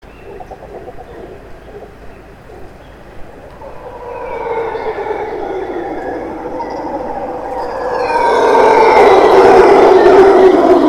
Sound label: mantled howler alouatta palliata, zvuki revuna obezyany